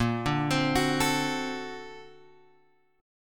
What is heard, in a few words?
Bb Augmented Major 9th, Guitar, Open F; 10,9,12,13,12,x; Bb+M9 arpeggiated; JGuitar